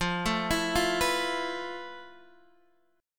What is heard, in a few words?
F Major Sharp 11th, Guitar, Standard; arpeggiated; JGuitar